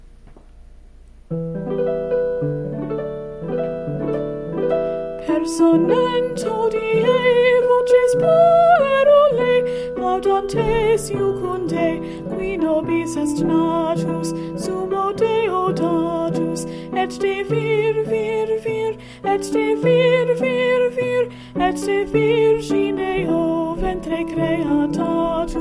medieval christmas carol performed on keyboard vocals; personent hodie